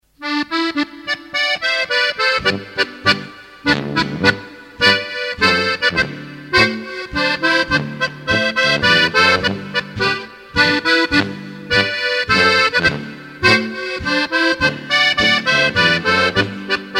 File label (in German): Plattler; Blindauer